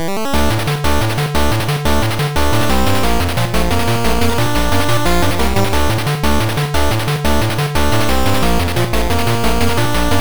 8bit

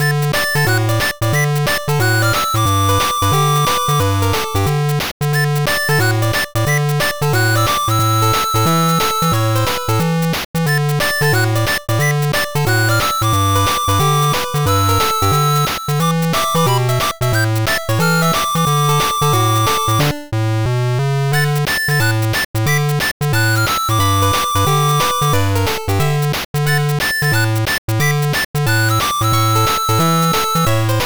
8bit

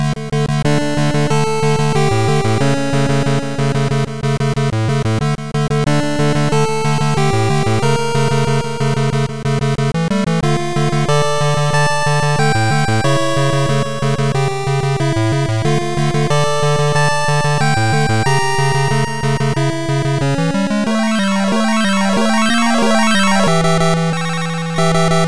8bit

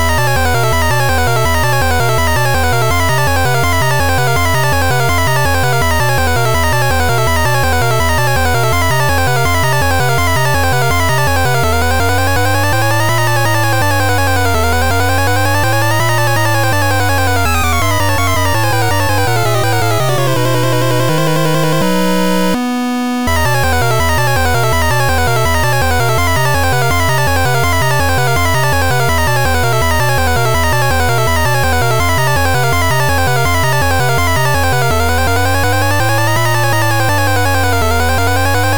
8bit Crisis

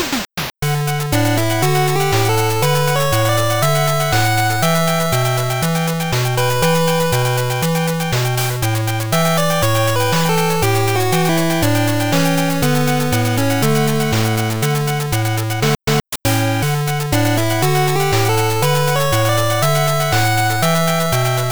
8bit

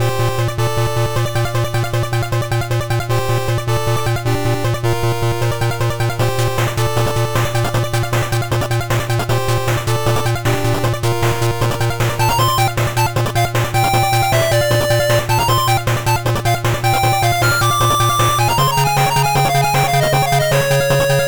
8bit Sky Level

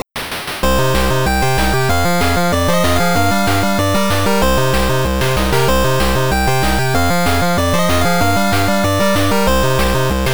8bit

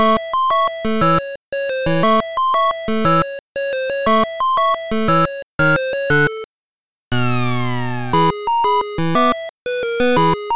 8bit She Is Goofy

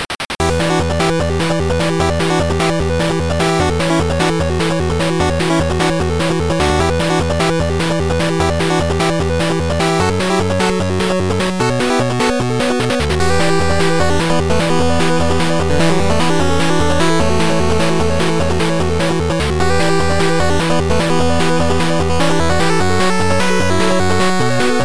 8bit Rival Battle Theme

